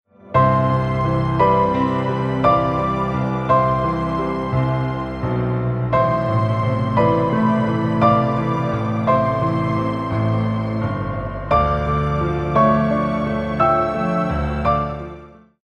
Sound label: straight piano; kagaya